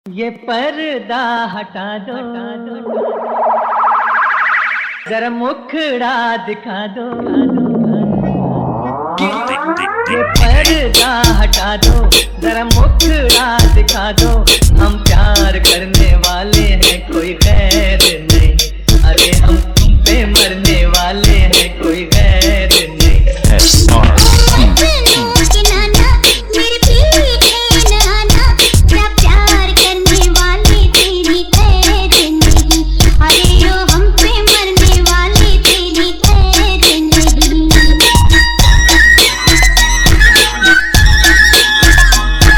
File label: trap mix